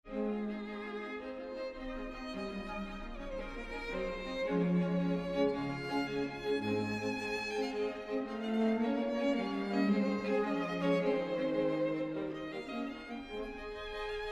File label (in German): schubert quartett satz